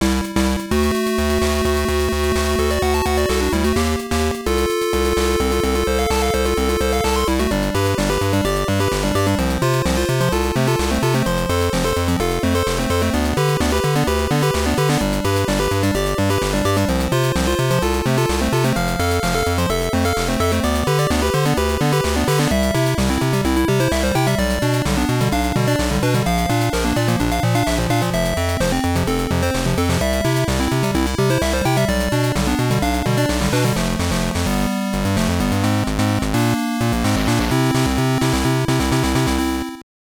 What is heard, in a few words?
BGM; Kinniku Banzuke GB2 Mezase Muscle Champion; GBC